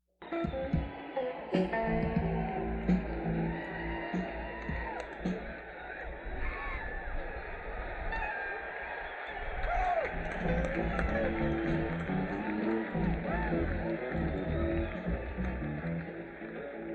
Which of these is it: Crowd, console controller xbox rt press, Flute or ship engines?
Crowd